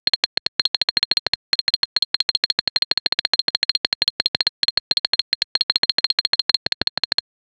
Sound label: 8 bit game typing sound